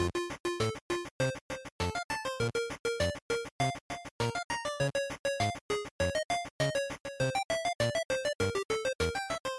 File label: GB General Stage1 Loop100